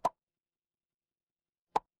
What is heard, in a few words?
cartoon pop